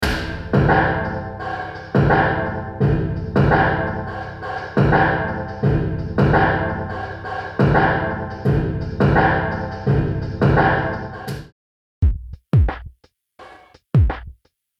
Drum Loop Late; リバーブのテイル（残響）部分に作用; Temperance Pro

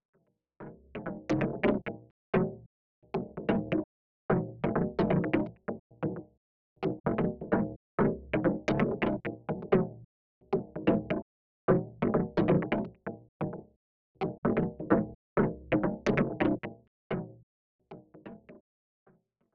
Chorus solo

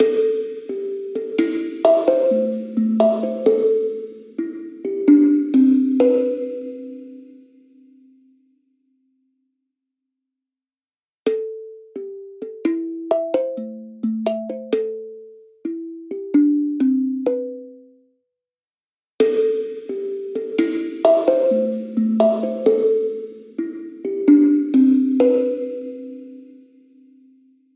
Hang Drum Hollow Room; Temperance Pro

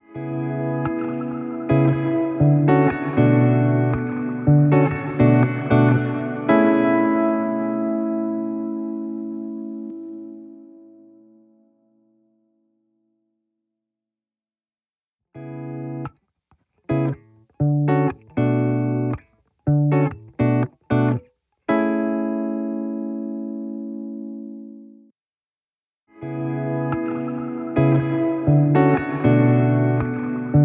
Guitar Spring into Summer; Temperance Pro

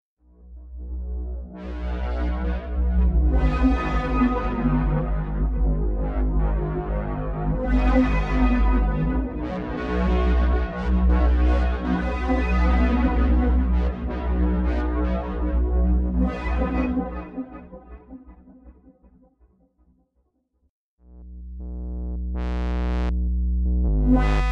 Modular; H9 Bundle; Plug-ins: Crystals (Serpent Tongue) + TriceraChorus (Schmozone)

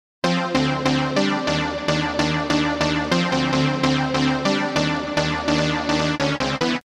synth C2 sub

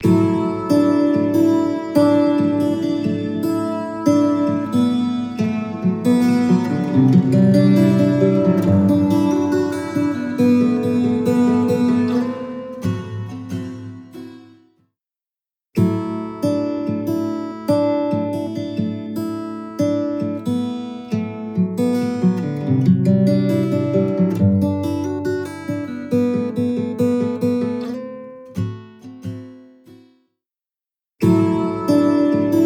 Acoustic Guitar Dark Tails X Bright Wash Binaural; バイノーラル音源のサンプルは、7.1.2ch環境でミキシングされ、Dolby Atmos®バイノーラル・レンダラーを用いてレンダリングされています; MicroPitch Immersive

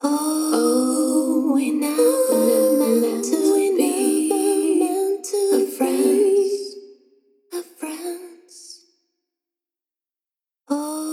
Female Vocal Bus Scooped Room; Temperance Pro

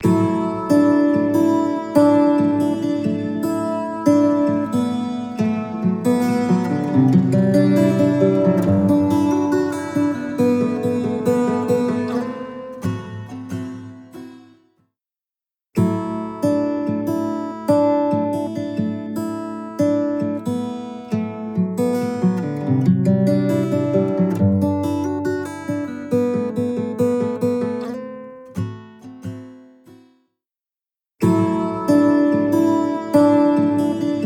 Acoustic Guitar Dark Tails X Bright Wash Stereo; MicroPitch Immersive

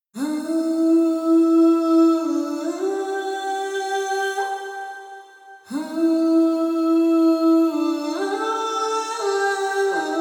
Female Vocal; 1: Positive Temperance; Temperance Lite

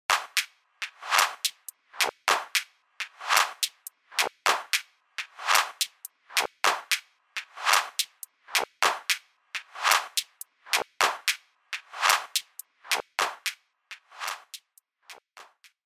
clap on

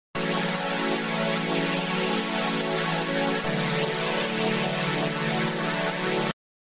synth chord A; デフォルトでかかっているエフェクト1のChorus2を強めにし、エフェクト2にPhaserを入れ、StereoのノブをまわしMaxに設定。右上にあるFILTER1のcutoffを12時くらいの高さにし、広がりがありつつ攻撃的な音にしてみました。; Dig-E-Lead

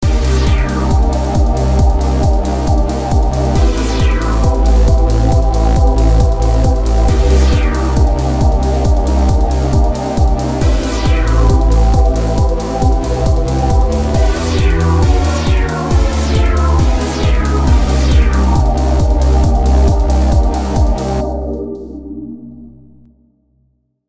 ADSR

